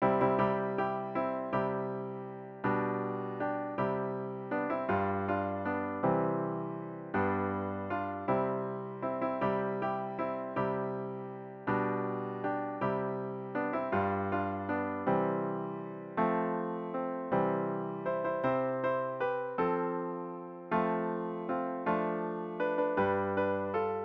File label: midi